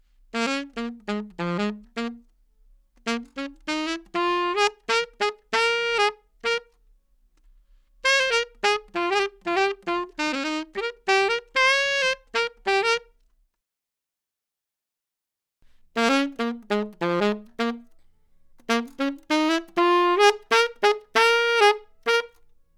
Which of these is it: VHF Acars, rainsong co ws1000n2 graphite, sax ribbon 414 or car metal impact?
sax ribbon 414